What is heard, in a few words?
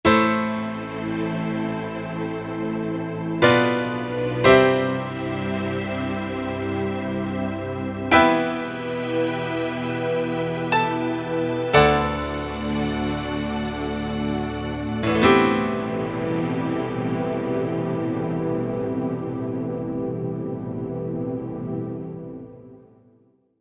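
Pno N Strgs, piano strings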